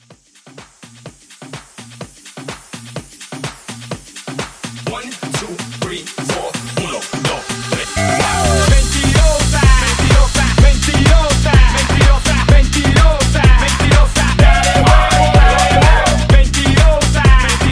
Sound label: Hype Edit 126